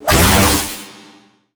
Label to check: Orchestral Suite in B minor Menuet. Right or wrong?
Wrong